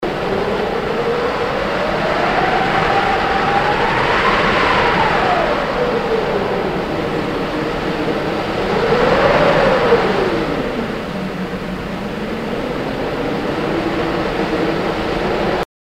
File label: Wind